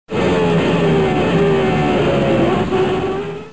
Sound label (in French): Formule 1